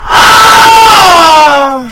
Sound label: goofy sound effect